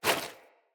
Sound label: Foley Action Default Punch, Default Clothing; Player